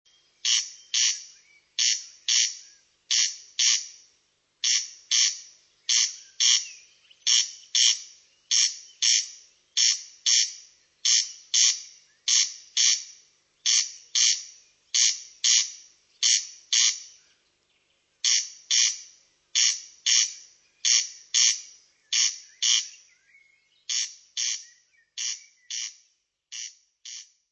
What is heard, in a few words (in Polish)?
derkacz